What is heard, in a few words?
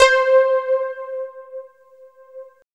SYN SYN, Roland, digital; Sound & Vision - Gigapack I CD 2